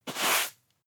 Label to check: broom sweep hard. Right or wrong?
Right